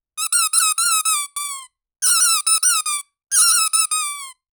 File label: most original duck toy sq, toy